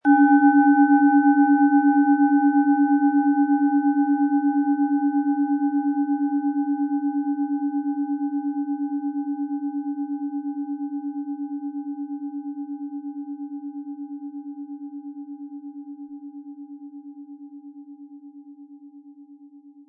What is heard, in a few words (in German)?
Planetenschale